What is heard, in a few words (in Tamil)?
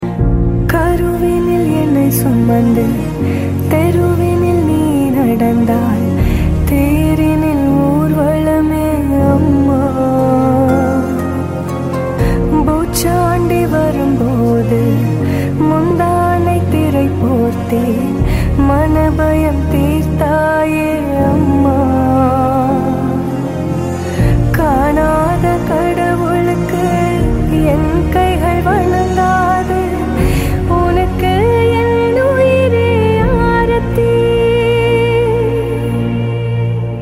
Tamil Song; KGF